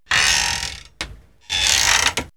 Rocking Chair; X-Static Goldmine

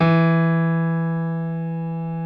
PIANO E 2; AKAI S-Series CD-ROM Sound Library VOL-8

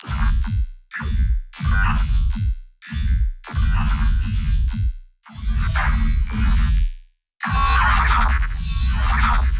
granular

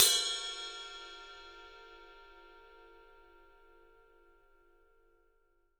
Studio Ride; E-MU Producer Series Vol. 5 – 3-D Audio Collection; 3D Percussives

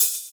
Ride; Equipped Music; Loungin House

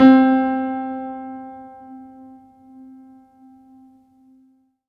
C4, SynthLine; 90 s Sample CDs; NiceBeats; SynthLine VOL-22

